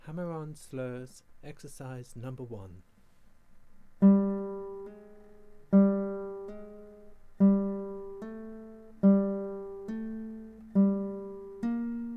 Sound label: Hammer On Slurs, guitar